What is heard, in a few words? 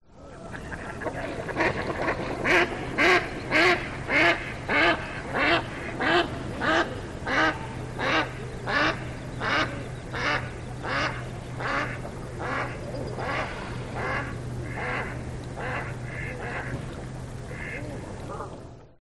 Bird Mallard Duck; audio hero